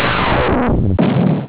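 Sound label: SUCCESS; BARTQST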